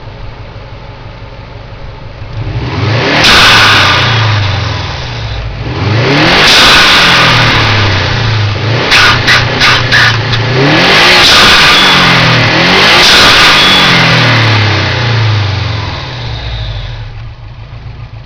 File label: turbo